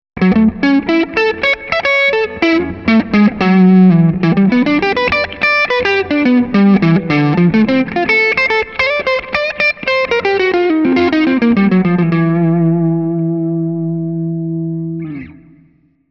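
Humbucker Mellow Overdrive, Jazzy Mellow Overdrive, chitarra elettrica Kramer; Neck pickup; Modalità: Overdrive; OD Drive: 3/10; OD Tone: 5/10; OD Volume: 3/10; Clip 8 – Jazzy Mellow Overdrive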